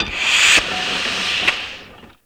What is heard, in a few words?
MACHINES